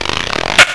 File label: slate; Apple Chronicle; Apple Computer